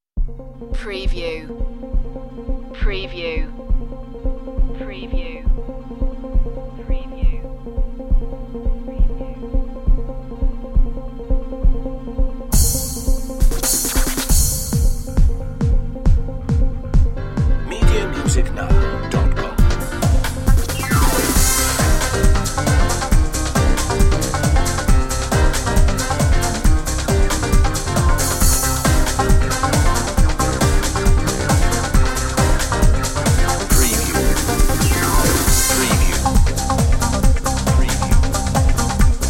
hot club underground no sax intro

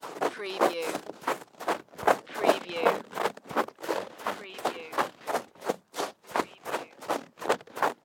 Footstep Snow Run

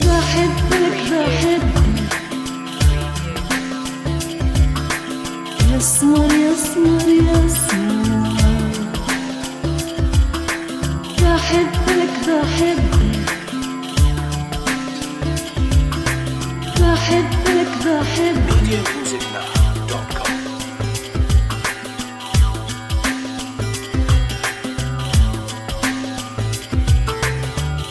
electro world wide syria loop

